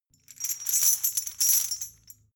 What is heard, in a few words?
metal chain handle clean